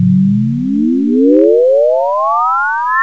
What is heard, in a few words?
FOURIER